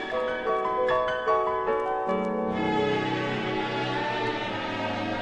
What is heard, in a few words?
strings n piano; STRINGS ORCHESTRAL CLASSICAL LOOPS